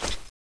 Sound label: draw; thanatos9; cstrike; weapons